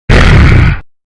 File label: hurt; sonic exe